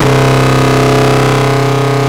WAILING, DIRE LIKE; East West - Global Sounds

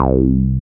SYN SQUUUSYN, dance synth; Sound & Vision - Gigapack I CD 2

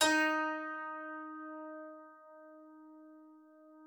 klavicymb D#4; Xsample VOL-8

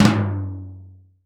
FLAM, 50S JAZZ; Northstar - New Gold VOL-1

